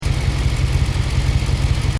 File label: Engine: Tick Over Revs Loop, car; Cars; Vehicles; Stuart Duffield